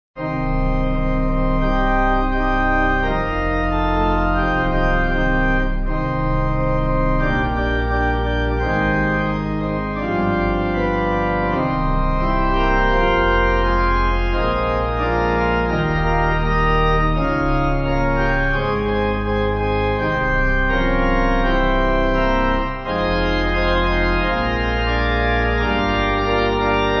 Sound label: When God Almighty Came Pipe